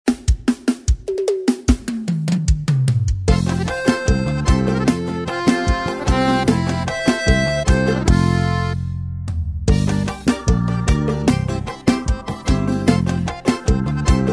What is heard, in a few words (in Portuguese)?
Playback Versao Forro; forro cristao sax alto eb